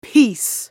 spell cast effort; Karma; Character Voices; English

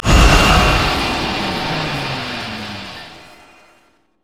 creeping death; Mordekaiser

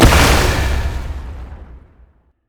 blast; LoL; syndra

